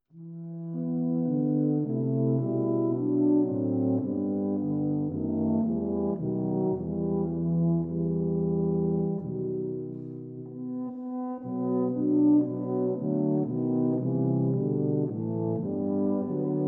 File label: Tuba Trio